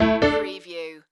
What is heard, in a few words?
Casino Slot Machine Honkypiano